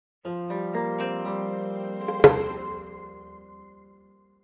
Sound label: respawn; B Path of the Teal Lotus